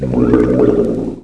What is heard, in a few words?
drown; Alien Arena; xenoid